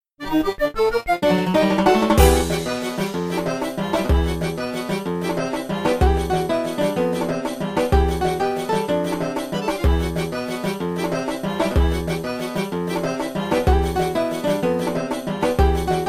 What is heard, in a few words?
ambiance - fight; tfc